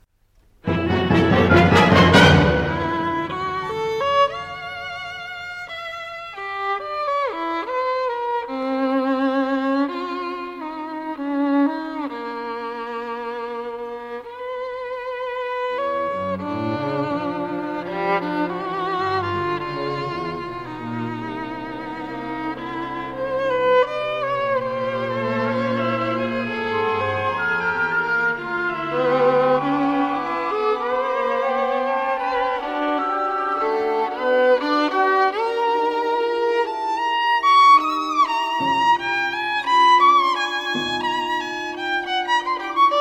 Concerto violon et orchestre symphonique; RTBF

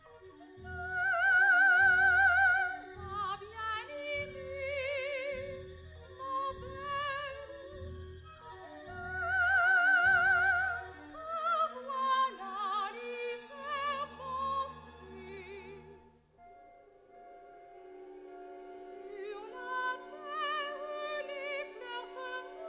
chant 2 soprano et orchestre; RTBF

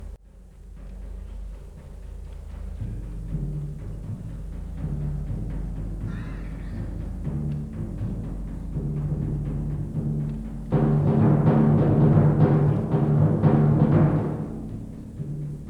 Contrastes pour orchestre symphonique

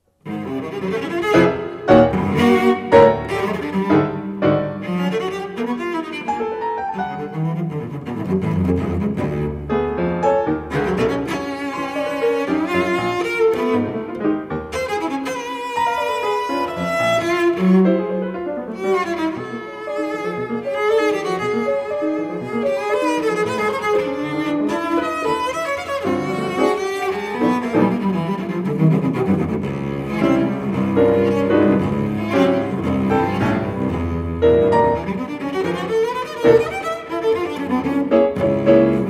cadence et allegro violoncelle et piano; RTBF

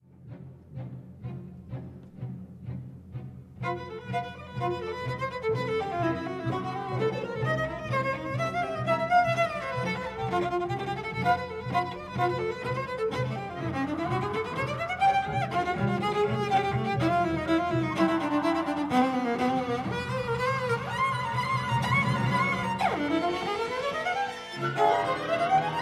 Concerto violoncelle et orchestre; RTBF

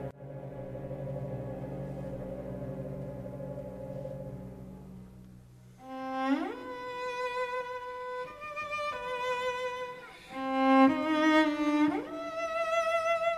Concerto violoncelle et orchestre; RTBF

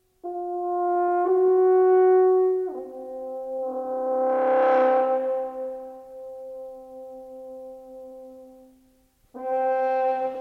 Fantaisie cor et piano

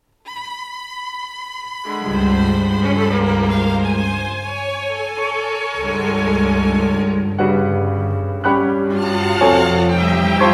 Cinq Pieces Breves piano et orchestre a cordes; RTBF

